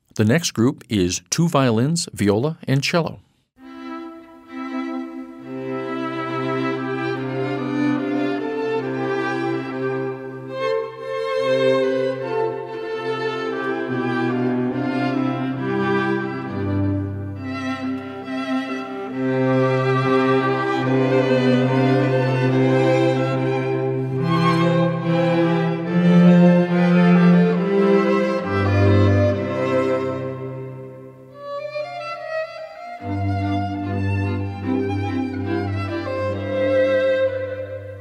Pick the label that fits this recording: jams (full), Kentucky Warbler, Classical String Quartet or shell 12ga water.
Classical String Quartet